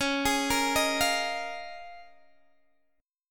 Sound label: C sharp 6th Add 9th, Guitar, Open G